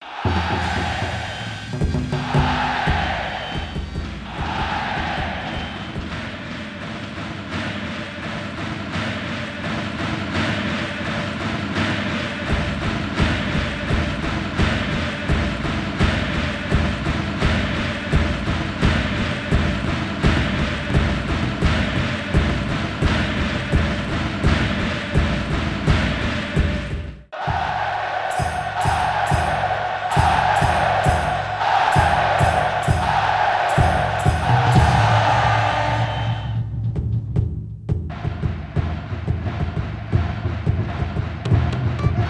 Spectator Chant; Heat of Eleven 98; arcade

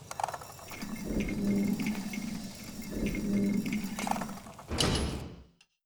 Metal Gate Open; adams venture origins

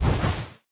Fiery End; Focus

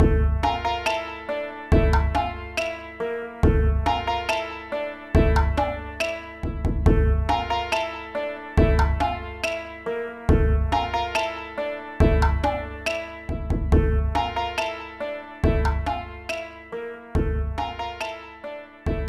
Jig; eigo de tabisuru little charo

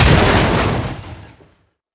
Car Damage Large; gamerip; Car Simulator 2; android

